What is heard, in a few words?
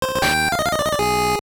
Jingle; Go Go Ackman; SGB